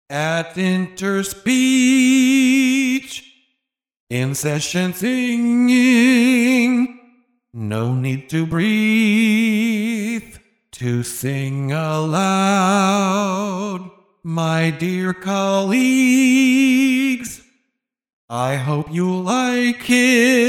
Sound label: solo, singing synthesis; winner of the singing synthesis challenge 2016; interspeech